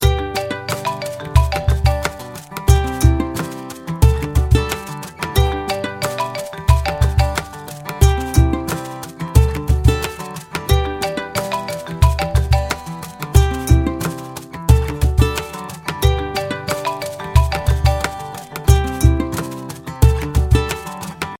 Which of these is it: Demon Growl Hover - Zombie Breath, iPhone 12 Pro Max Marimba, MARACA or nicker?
iPhone 12 Pro Max Marimba